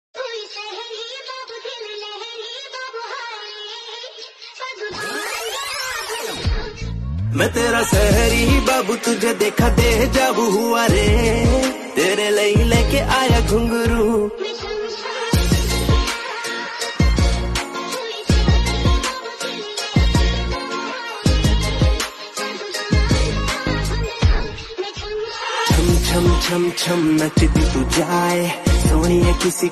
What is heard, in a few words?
Ringtone